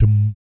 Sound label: BS DMM, Vocal Planet Jazz & FX; ILIO; BASS DUMMS